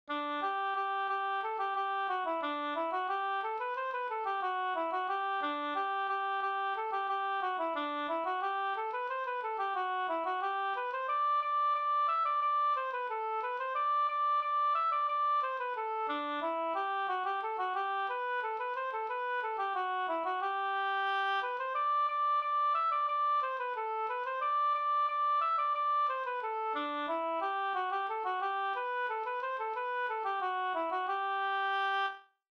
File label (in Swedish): polka